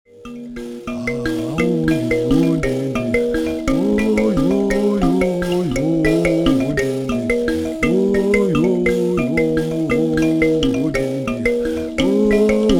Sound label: mbira